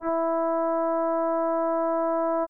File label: bassoon e4